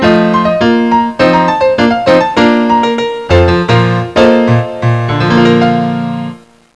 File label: PIANO